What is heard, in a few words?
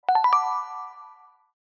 BONUS WIN; Zodiac Millionaire